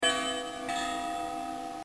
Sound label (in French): Cloche